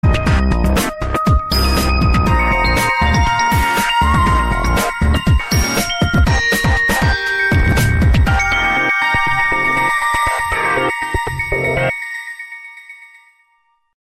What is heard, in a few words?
City drivin, Samsung phonetones; GT-C3528